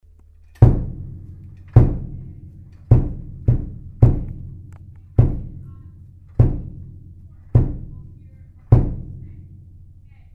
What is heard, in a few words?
bass drum